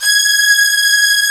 G#4, VIOLIN SECT; AKAI S6000 CD-ROM - Volume 1; Strings

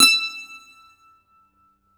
MANDOLIN, Plucked Strings

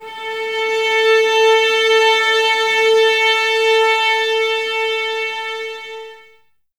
violins A 4, string ensembles; Miroslav Vitous; 11 Violins

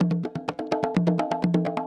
Percussion Loops, Bongo & Conga; Houseworx

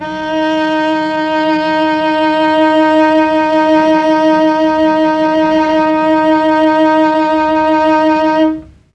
D#3 300 CELL, Mellotron, STRINGS; Mike Pinder - Mellotron Archive CD

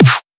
too big snare; M8

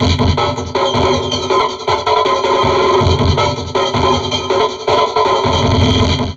Drum Loops Crazy Processed

